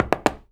133 STEPS, Spanish And Gypsy

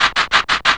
PERC, Hip-Hop & R&B; USB Soundscan vol.47; AKAI; HIHHOP

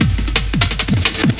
loop, 170 BPM, drum & bass; Ueberschall; Drum & Bass Flash Trackz

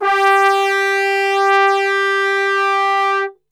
G 2 Brass Super Section; Best Service; TRMBSTRGHT; 90 s Sample CDs

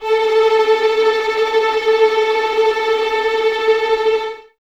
VS A 4 Tremolo, 11 Violins; Miroslav Vitous

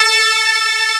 DIDI, SYNTHESIZER, DIGI WAVE

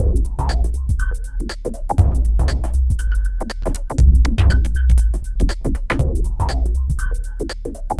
angle swing 120bpm

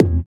SITAR; Spectrasonics; Metamorphosis; INDIATEK

